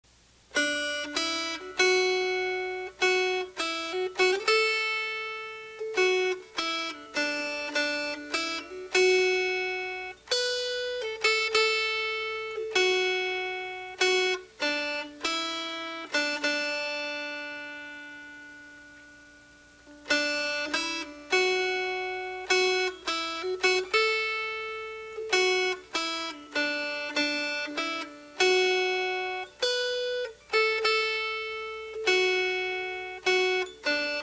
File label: Melody; Hard Times